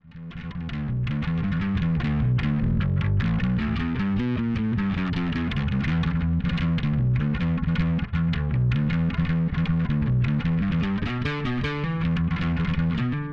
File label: Classic Rock